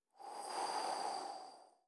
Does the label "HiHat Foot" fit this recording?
No